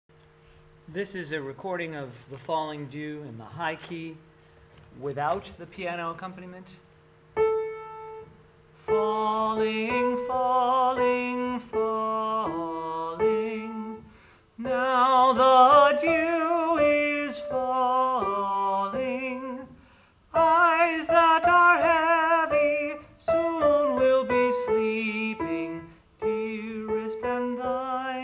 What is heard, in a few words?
falling dew high voice no piano